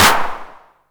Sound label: 909 Clap Tape Sat; Goldbaby Tape808 & Tape909; The Tape 909